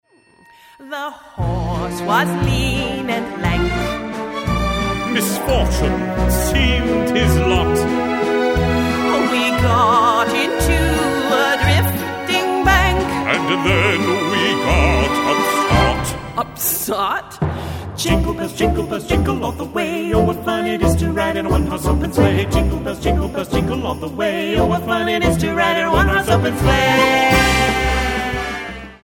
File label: jingle bells